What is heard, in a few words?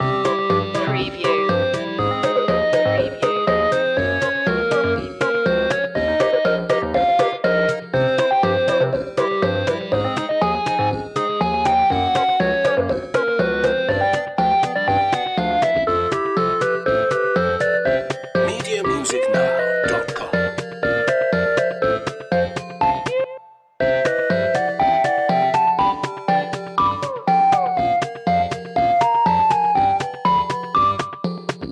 8-bit boss loop